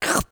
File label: eat; minecraft; SMCNV-pack